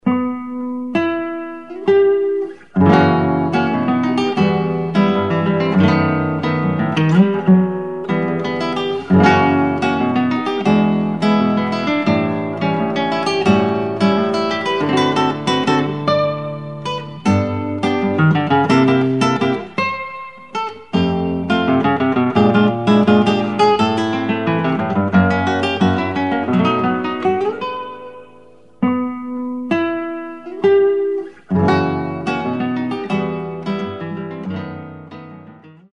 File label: Choro